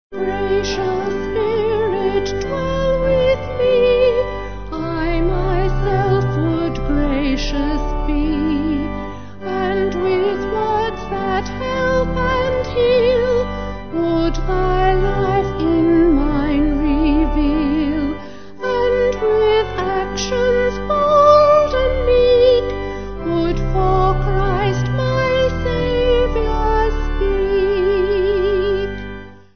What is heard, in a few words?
Organ Vocal; Vocals & Organ; Gracious Spirit Dwell; Dix